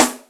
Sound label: OpnRimA, Yamaha DTXpress, Acoustic Snare